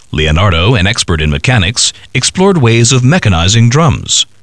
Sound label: drums